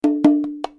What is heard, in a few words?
bongos